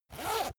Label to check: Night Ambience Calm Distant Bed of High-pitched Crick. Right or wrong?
Wrong